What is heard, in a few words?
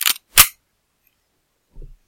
gun sound